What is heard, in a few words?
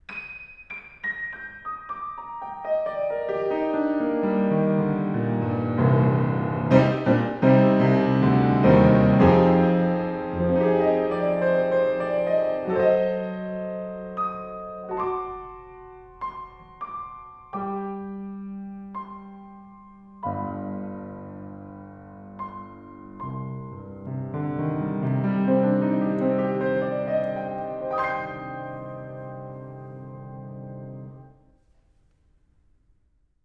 Piano, Horiz Open Mic